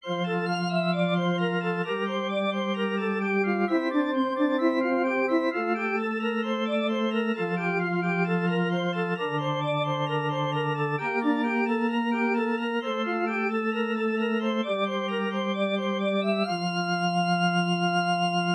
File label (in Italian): Esercizi di contrappunto a due voci; zur Dritte Gattung; Ronge pagina 66